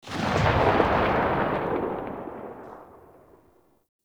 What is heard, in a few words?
Grenade Launcher Tail; Weapons; Scaldra